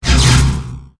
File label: veil rifle